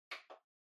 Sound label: Portable Stereo Far Button